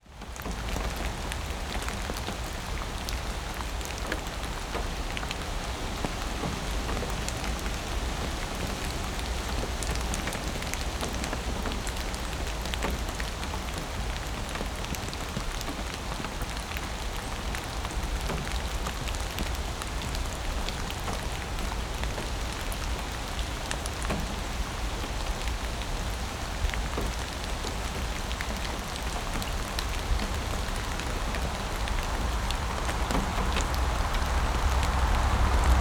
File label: nature rain recorded out of car window parked engine off traffic pass infrequent wet road